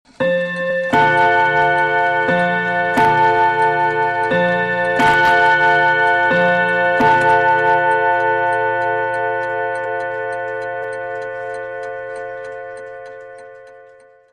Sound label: Old Clock